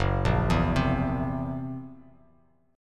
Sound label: Fsharp 7th Flat 5th down Bass Standard; arpeggiated; JGuitar